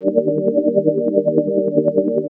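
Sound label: Pad Synth; Equipped Music; Loungin House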